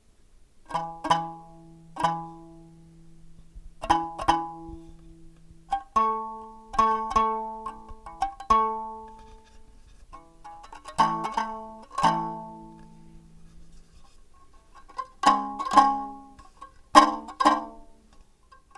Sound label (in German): Sample, Fischdosenbanjo; Basteln